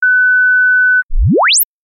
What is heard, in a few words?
GlideSweep 20-20k 0.5s with PreTone